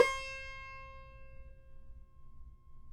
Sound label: CUMBUS C, Guitars; Spectrasonics; Hans Zimmer Guitars VOL-2